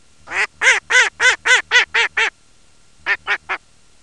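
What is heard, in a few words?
mallard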